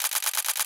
multishot click; Motorola Droid-Razr-M

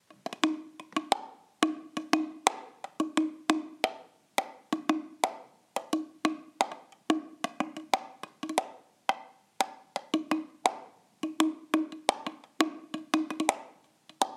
ringtone drum; Mudita Pure

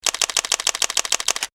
Multishot Shutter; LG GD570 dLite

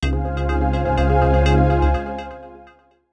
Alert, Smooth Breeze, Fine Tuned; Sony Ericsson; Tyra (G900); PlusFourSix; Lars Edvall; TyraFeel Alert 3

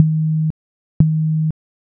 Vib dot dot; Hijacked by MTV

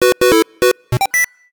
SMS; SKY IM-T100K VEGA No.5

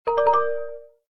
Arrive, notifications; Casper; Via-M1

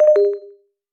Wifi Disconnected; Alcatel 3 OT-5052D

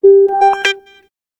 Wahwah; Sony; Xperia Tipo

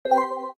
cam snap; LG Optimus F3 MS659

